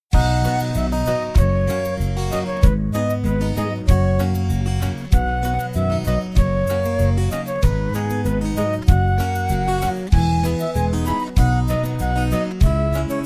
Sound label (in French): j aime chanter